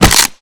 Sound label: Groin Crunches Short, flesh